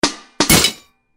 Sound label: anvil break